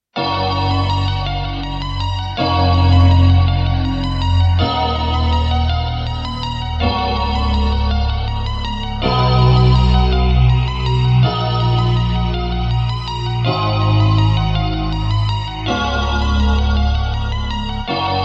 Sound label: Ostinato Ostinato